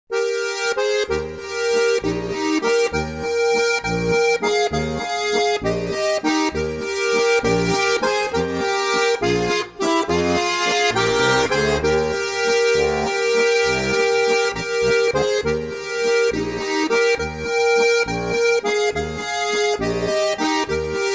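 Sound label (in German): Walzer, Harmonika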